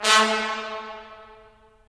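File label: brass trumpets staccato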